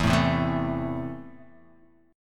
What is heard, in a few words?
E Suspended 4th Sharp 5th